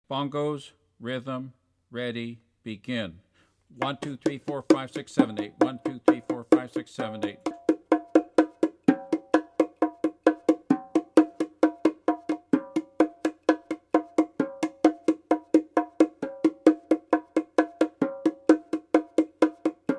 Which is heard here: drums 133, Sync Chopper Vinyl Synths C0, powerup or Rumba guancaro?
Rumba guancaro